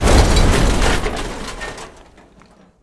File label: impact debris fall